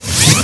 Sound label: glass wall; free 2 play